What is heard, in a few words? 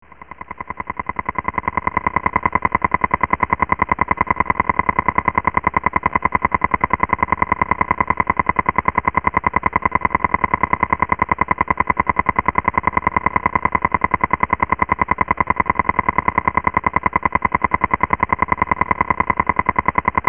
LORAN-C